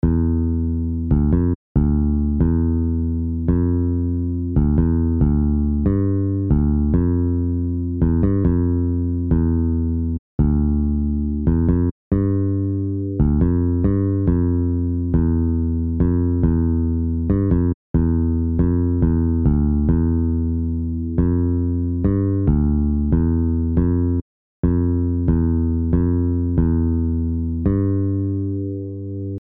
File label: Bassline; Jazz Funk